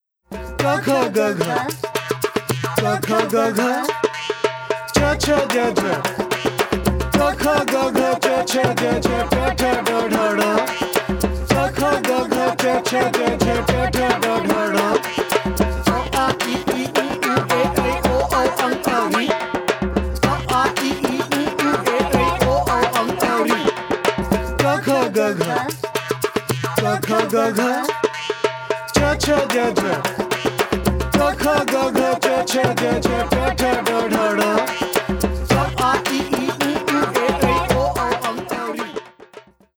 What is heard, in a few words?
ringtone